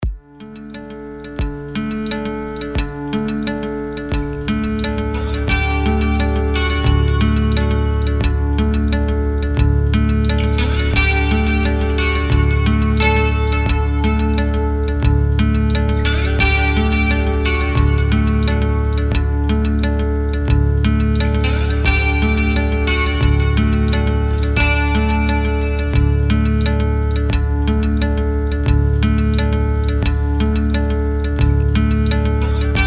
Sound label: instrumental